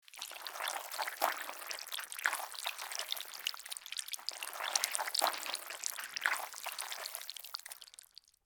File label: Slimy organic movement wet slithering; slug and worm; squelchy and organic